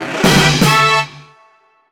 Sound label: Billard Ball, Curling Stone, & Bowling Ball; Paper Mario Sticker Star; Thing Sticker